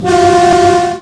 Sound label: klaxon, Train; OpenBVE